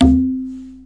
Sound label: "dhin" no slide, Tabla